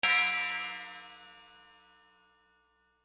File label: gong